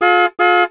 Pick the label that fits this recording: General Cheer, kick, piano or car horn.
car horn